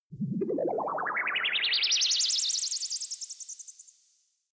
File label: long wobble